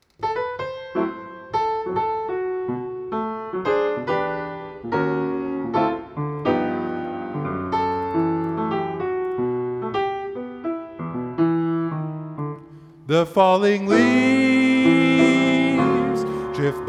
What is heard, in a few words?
Vocals and piano